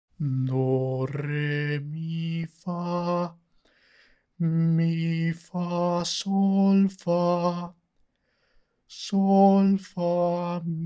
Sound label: Sung; solfege